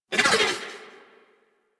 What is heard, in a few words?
Keen Senses Deactivate; Warframe; Catbrow; Mods